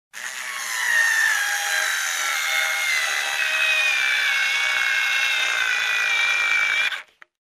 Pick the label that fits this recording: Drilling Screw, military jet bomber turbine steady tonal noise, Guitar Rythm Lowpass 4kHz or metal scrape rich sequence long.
Drilling Screw